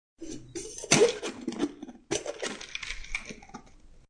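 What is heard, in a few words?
Mixer Lid Open, Popping open a metal ice shaker.; Drink Mixing; Bars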